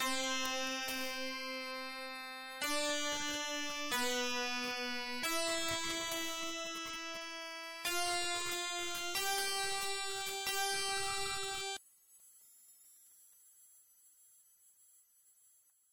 Victory; Golden Axe III; Genesis

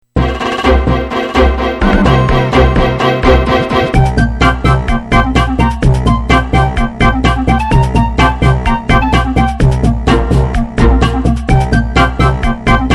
chiptune, Genesis; Sonic CD

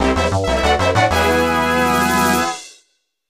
Race Fanfare; Chiptune; Gamecube; Super Mario Sunshine

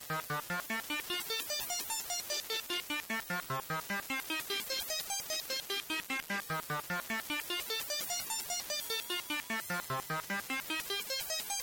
High Score Entry; Combat Cars; Genesis

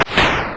splash; mm7sfx

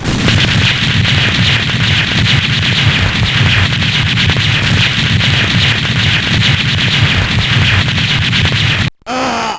explosion joke

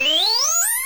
Charge Start; mm7sfx

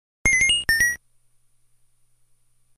pause jingle; Super Mario Land 2 - 6 Golden Coins

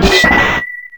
Ice Weapon; mm7sfx

